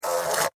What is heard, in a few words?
Wasp Fly Wing, Wasp, Fly, Wings, Land, Buzz, Short, Insect, Bug; Sound Effects:Animals:Insects; Wasps; Blastwave